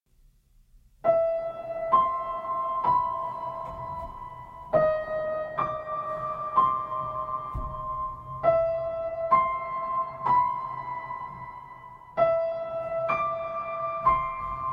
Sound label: piano melody